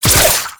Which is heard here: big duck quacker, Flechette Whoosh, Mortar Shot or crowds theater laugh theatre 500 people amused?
Flechette Whoosh